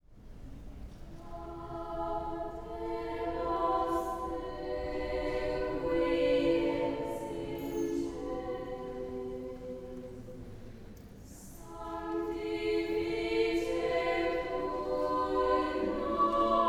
children's Choir

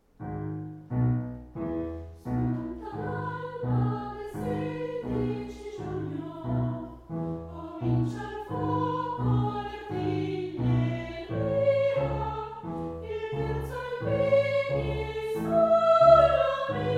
Monte Nero, female Choir

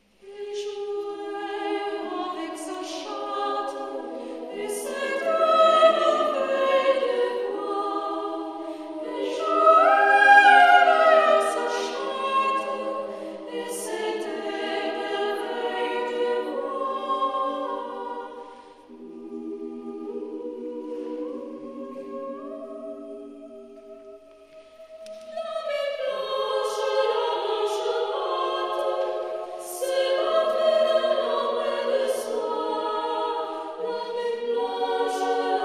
female Choir

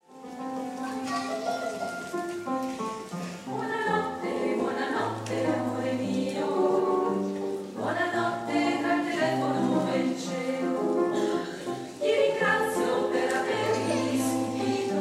female Choir

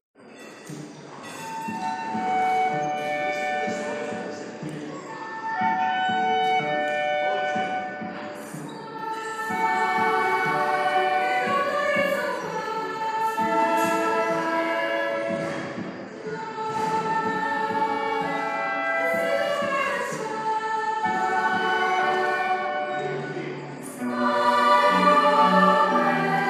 Life Is Beautiful, children's Choir

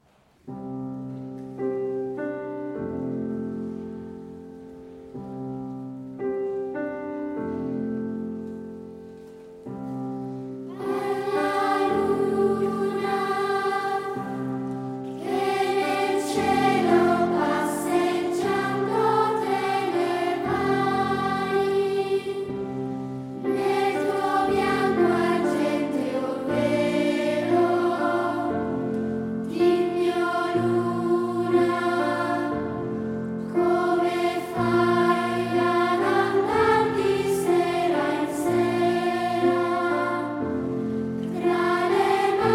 school Choir

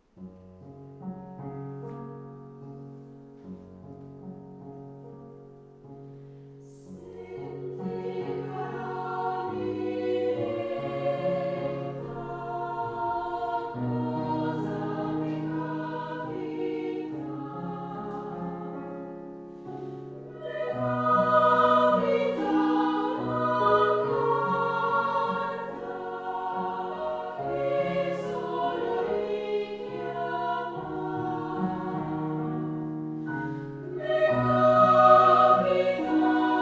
female Choir

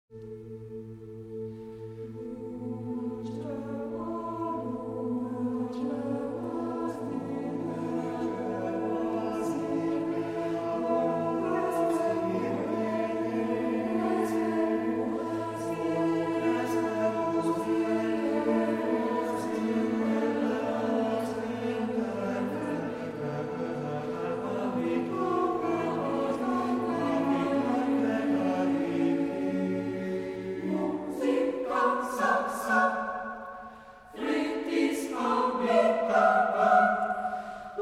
mixed Choir

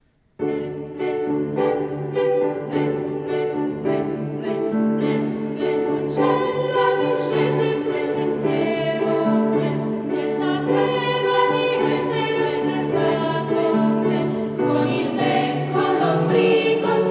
school Choir

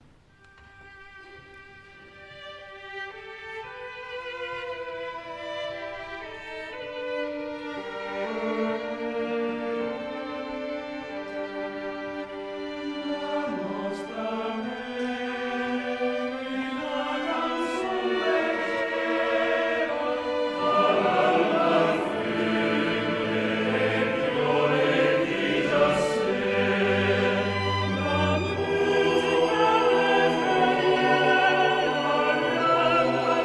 maleChoir

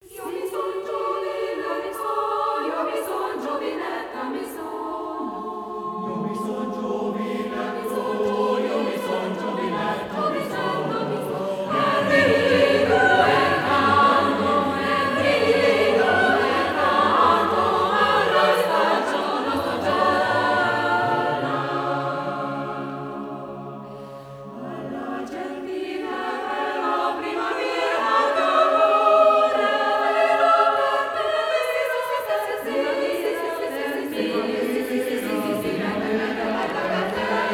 mixed Choir

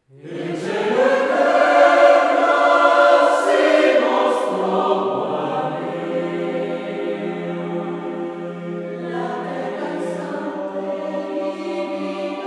mixed Choir

